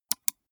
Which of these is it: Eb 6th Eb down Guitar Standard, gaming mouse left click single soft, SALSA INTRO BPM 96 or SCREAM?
gaming mouse left click single soft